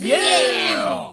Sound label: yay; bfdi